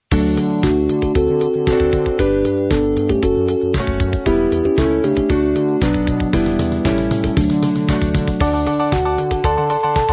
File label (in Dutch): karaoke; oversteken